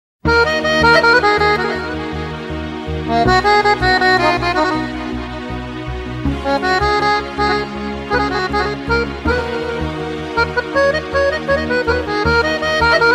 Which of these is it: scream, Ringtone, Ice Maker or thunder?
Ringtone